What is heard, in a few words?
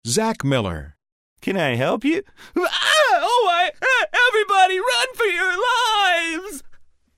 SCREAMING, Scared